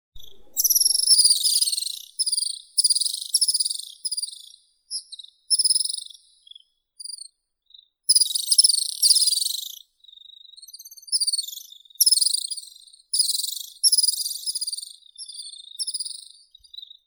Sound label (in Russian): zvuki prirodi strizh malii apus affinis